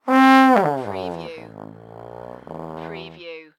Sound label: Cartoon Trombone Weird